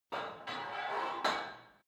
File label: put weight onto barbell reverby quick, fitnessstudio